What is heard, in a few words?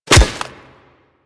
Melee Staff Impact Flesh; Warframe; Weapons; Staffs